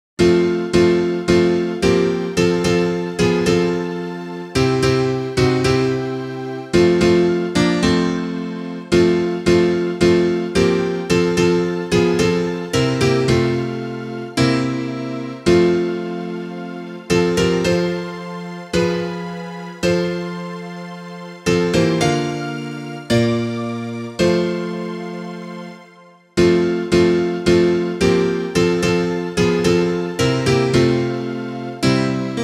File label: instrumental